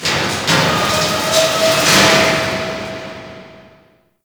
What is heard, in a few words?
Ocean Conveyor; Ambience; Warframe; Grineer Ocean